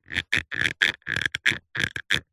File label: plastic thin squeeze pressure creak; foley